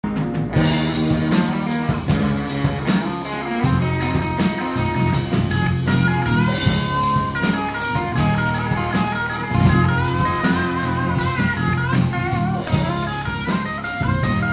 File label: solo, les paul